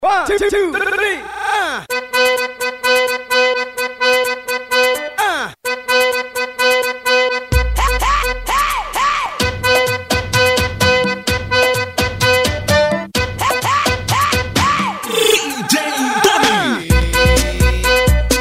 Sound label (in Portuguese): funk montagem